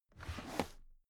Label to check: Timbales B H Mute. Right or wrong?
Wrong